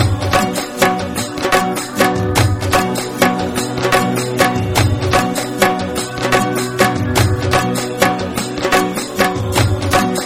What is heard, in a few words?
pakhtun saaz